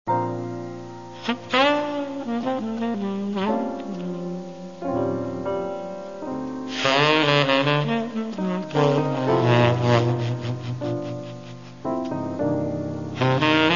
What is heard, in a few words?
soulful sax